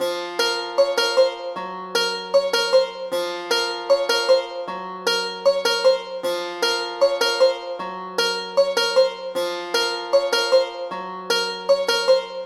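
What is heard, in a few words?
Ethnic Loop 77 Saz F minor; Ethnic Loop Vibes V2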